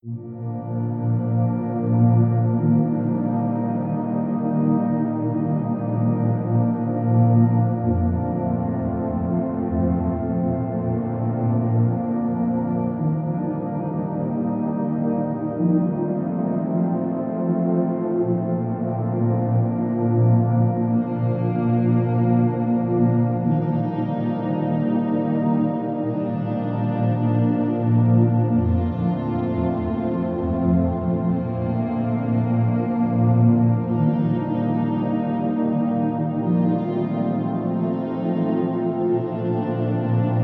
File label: chant